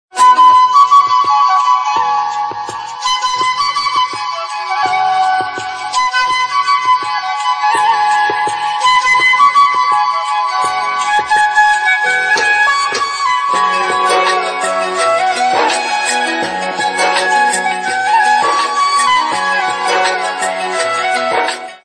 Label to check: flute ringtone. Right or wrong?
Right